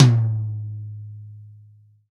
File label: high tom single hit g sharp key, Royality free tom sample tuned to the G# note.